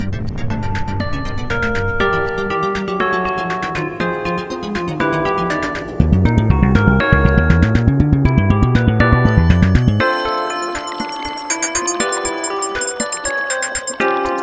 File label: Song piano in place of vocals